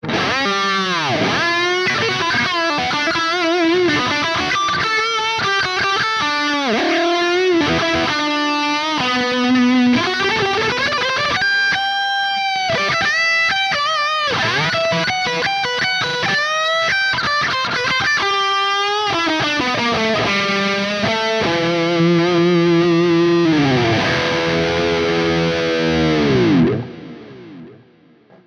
Mooer Preamp Model X Dual Drive; PlexiRanger

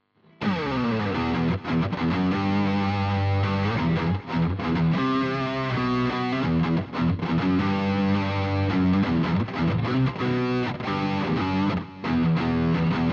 Drive Oct Cln

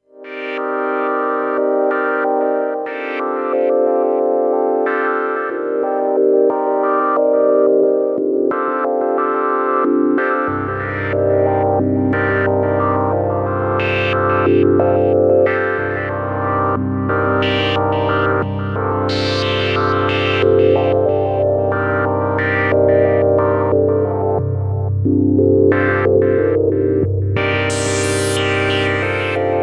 517 Randomizer

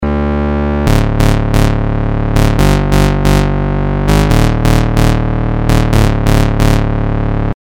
Bass

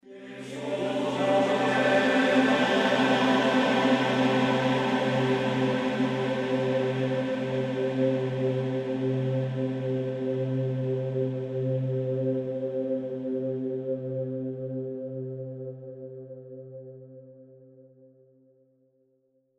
Soundscape

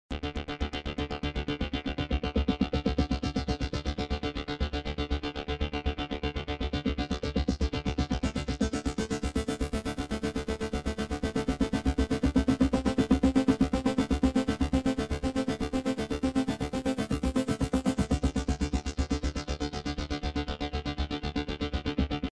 Kaox Arp

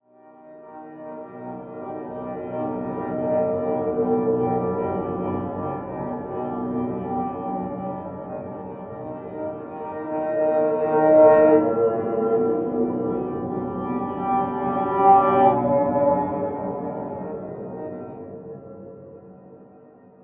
Novum TIMBRE Flower Links; Dawesome

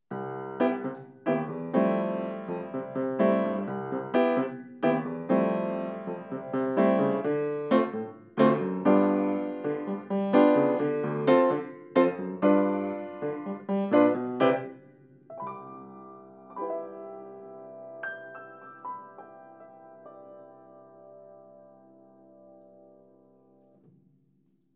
Piano

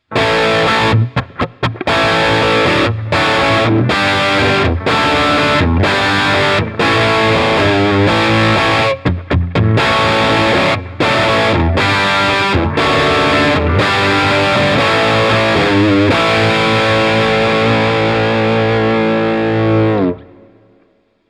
Quad Cortex Crunch

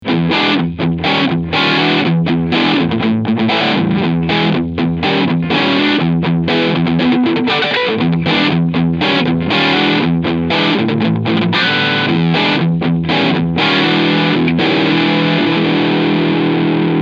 Keeley DDR Overdrive Pur

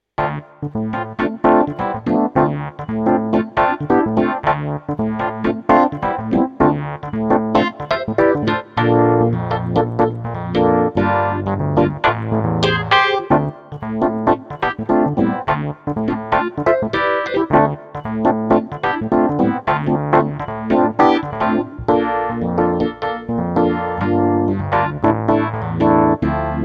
Tine EP Amp

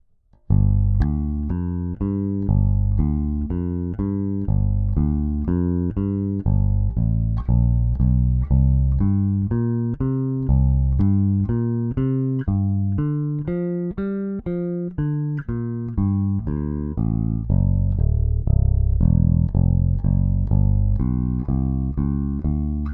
AER AMP One Two Three clean

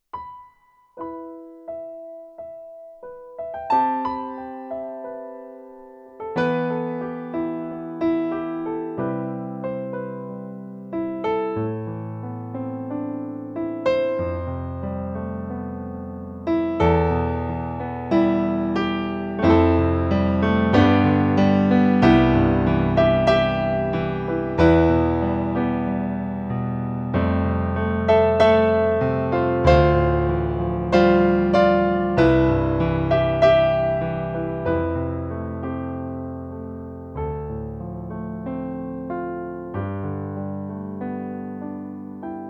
Kawai CN14 Concert Grand

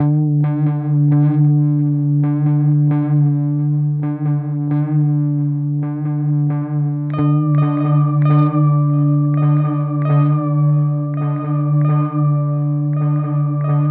Timeline Pattern; Strymon

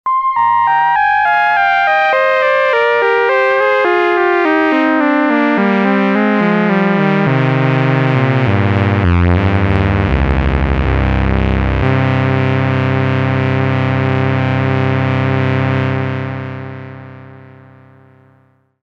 Duophonic

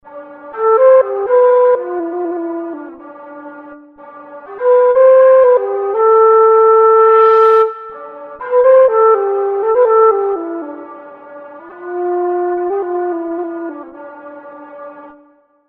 Tass Flute

